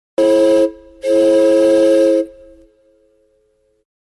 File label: boat whistle